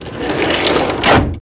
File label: metal drawer close; Max Payne